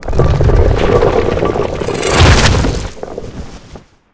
Die

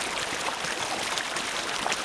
cem water

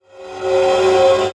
Light Orb

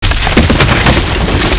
Mine Fence Main Action; Seer; GamigoNA

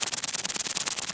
Walk

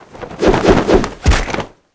Dust Golem Wide; Seer; GamigoNA

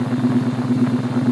Hover Board Walk; Seer; GamigoNA

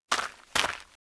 Goble King Walk

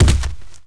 Run; Vehimoth

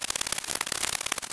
Combat Stand

